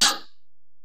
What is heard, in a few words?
EPerc, Alesis DM-PRO